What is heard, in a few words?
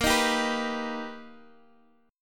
B Augmented Major 7th Bb, chord